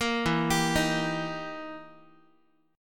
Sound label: E Minor Major 7th Flat 5th, E, down, Guitar; arpeggiated; JGuitar